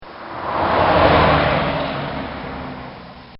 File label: Car Driving Off Quickly; Cars; Vehicles